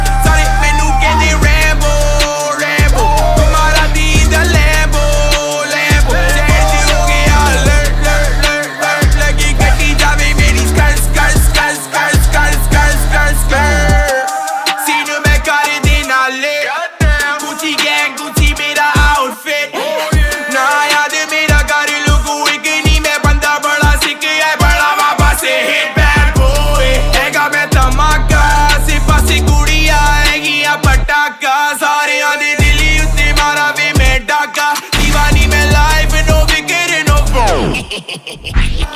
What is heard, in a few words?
Latest Punjabi Song Ringtone